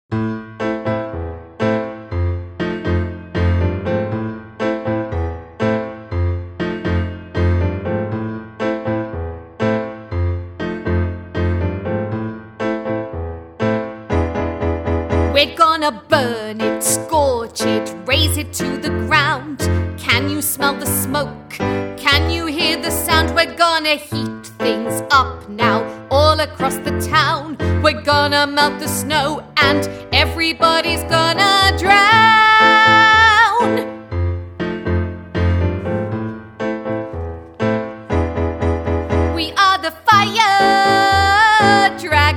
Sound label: w. vox